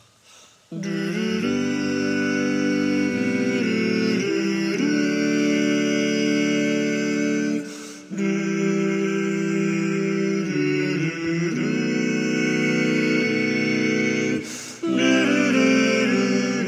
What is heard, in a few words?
baritone left; Baritone Part Left